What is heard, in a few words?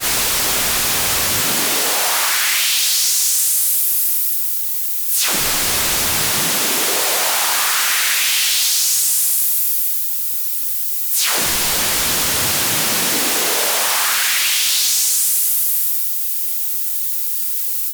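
noise high-pass filter stereo resonance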